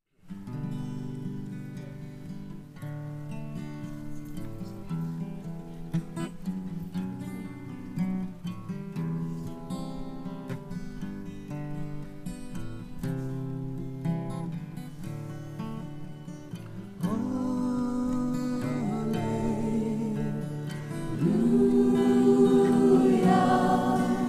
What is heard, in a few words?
halleluah chorus; rainbow spirit